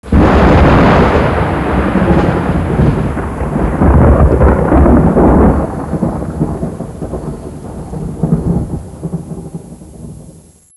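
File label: THUNDER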